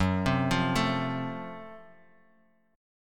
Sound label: Gb Minor Major 7th Flat 5th Gb Guitar Open G